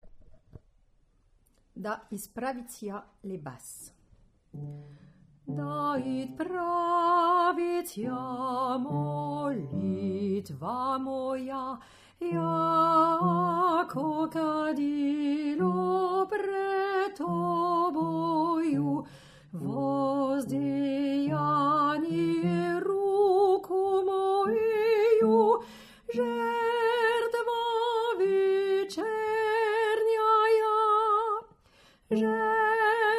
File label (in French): Basse; seconde chanson russe